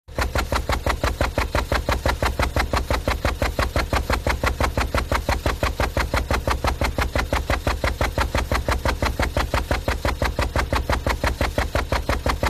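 1 Cylinder Engine Exhaust, 1940s 1 cylinder lister engine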